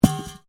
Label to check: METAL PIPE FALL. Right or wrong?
Right